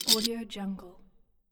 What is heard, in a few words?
handful of bottle caps